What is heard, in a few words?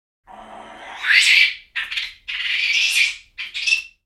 Monkey Capuchin Chit